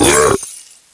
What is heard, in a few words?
Deer Jump